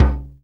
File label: KICK MEDIUM, VINTAGE FUNK; Zero-G; Funky Elements